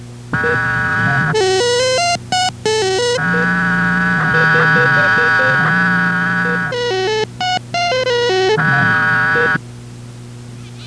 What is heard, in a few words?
startup beeps; Monsters, Myths & Legends STARTUP sound: "Thank you for turning me on. I am 2-XL, the smartest toy robot in the world.